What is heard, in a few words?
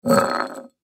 Pickle Jar Slide Cement; Foley Pickle Jar Slide Cement Surface Food; Food (Sound Effects:Household); Blastwave